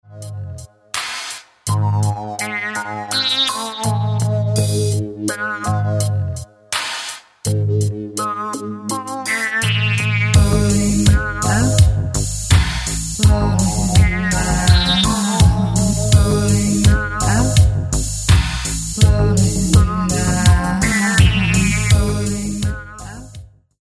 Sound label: distort